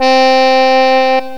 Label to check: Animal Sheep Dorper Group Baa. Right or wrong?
Wrong